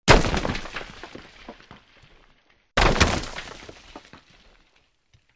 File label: Big Rock Hits, 2x; rocks